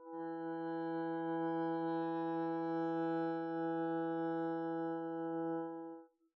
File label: arco e3 pp down, double bass; dsmolken